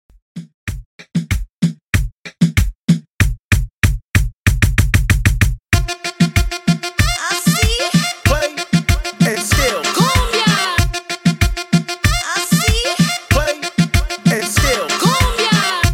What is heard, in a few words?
Reggaeton 95 Bpm